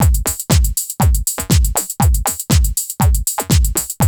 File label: GHET ROL